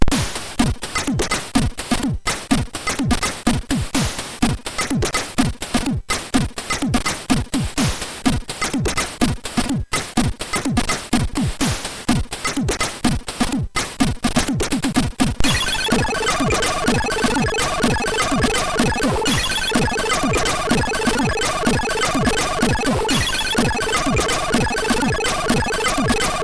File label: Bass and Drum, sid MOS6581R3; hvsc